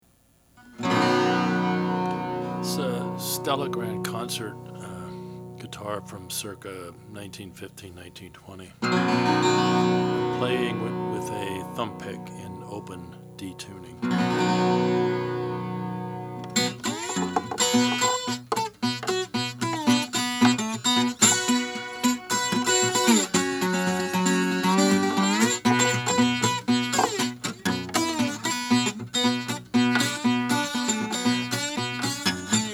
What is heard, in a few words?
oscar schmidt stella natural